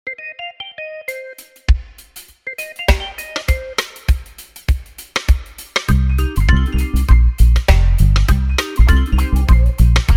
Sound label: reggae